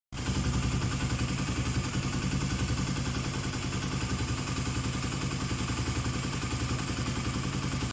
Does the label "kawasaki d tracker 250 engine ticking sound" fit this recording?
Yes